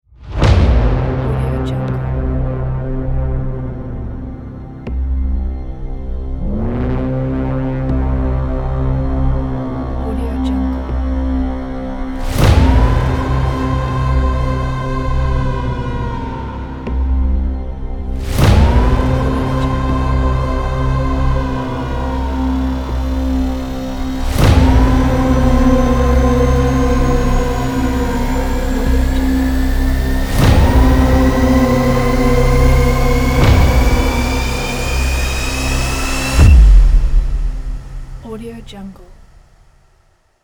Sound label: dark suspense cinematic trailer drone